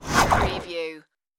starship door error

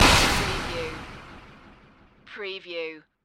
Magical Blast Delay

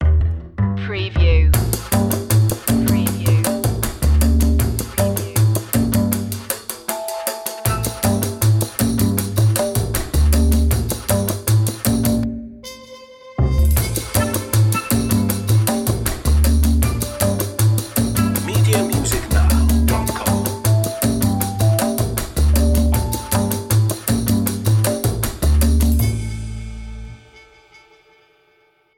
jazzy opener underscore

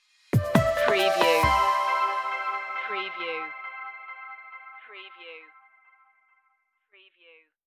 tones of aqua stinger

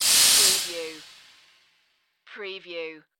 Hit Whoosh Static

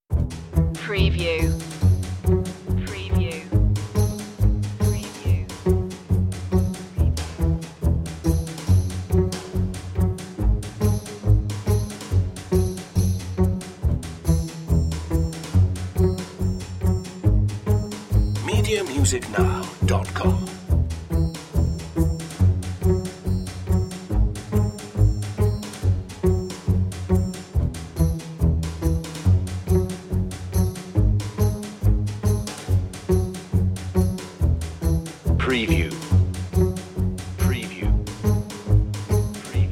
bass perc

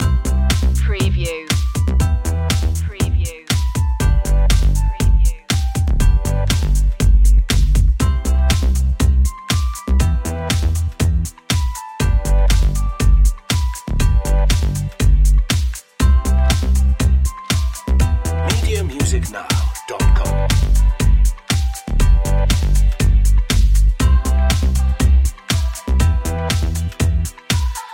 chic night

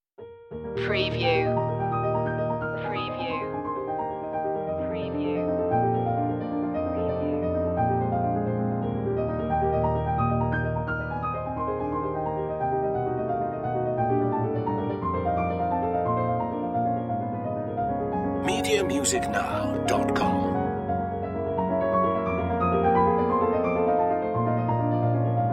chopin prelude e flat major vivace; op28

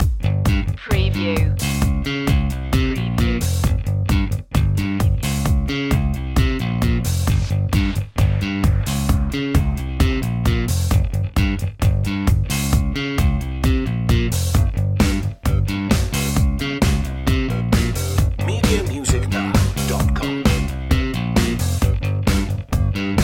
dirty disco loop

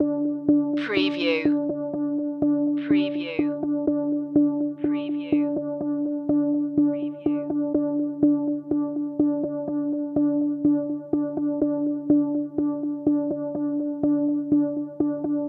starting something new loop

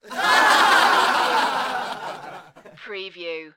Crowd Laugh; Crowd Sounds / Crowd Ambience

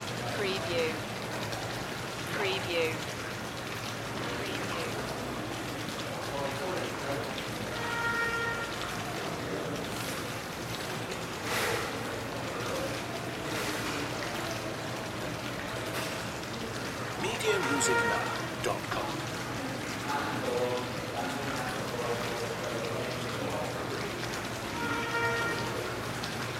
hotel hall ambience

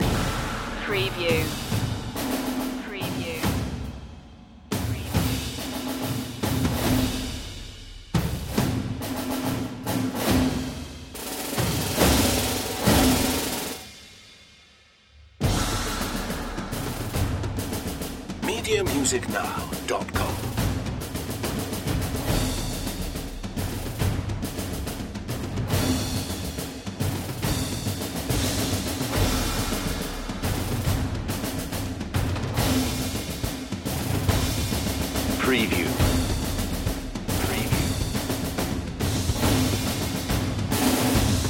percussion only

